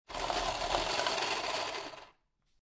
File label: Playing Cards Automatic Cards Shuffler Run; Card Sound Effects